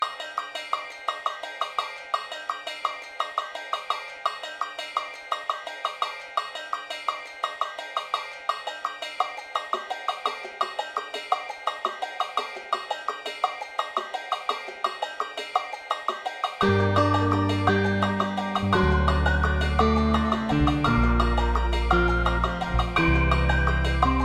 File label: Jingle Bells